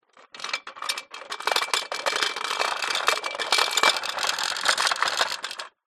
scooter childs metal push walk along concrete ground; zapsplat